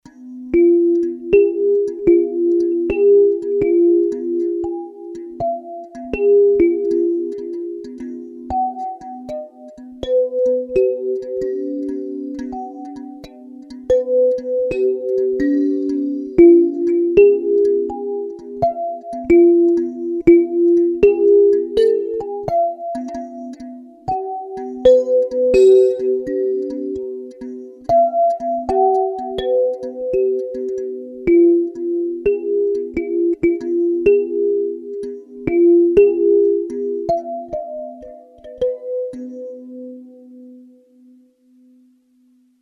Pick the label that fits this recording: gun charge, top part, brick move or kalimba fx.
kalimba fx